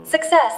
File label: success; squid games